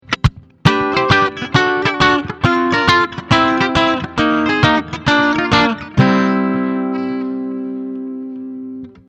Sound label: Amp clea Octavio